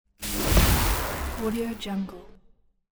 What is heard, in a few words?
thunder bolt with lightning effects; رعد و برق و صاعقه